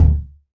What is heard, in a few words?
floppy bounce kick